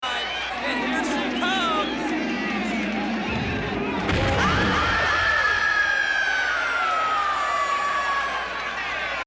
transdimensional scream

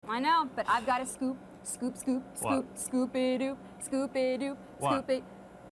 scoop song

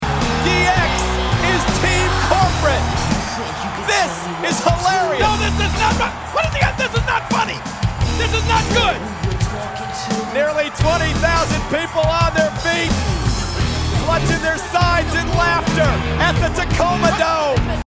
clutching sides in laughter; d x corporation impersonation; the live audience was having a good time

